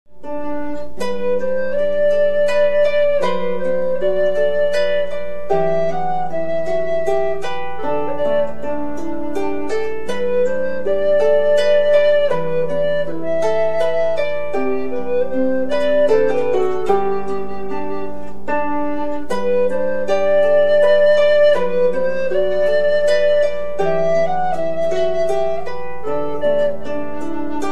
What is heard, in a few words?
harp and recorder; Inishere